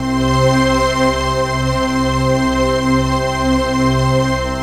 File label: Protostar, Strings; Sonic Foundry (Sony Creative Software); Myths of Technology